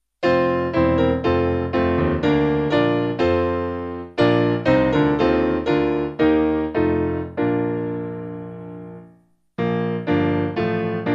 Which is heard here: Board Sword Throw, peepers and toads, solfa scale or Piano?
Piano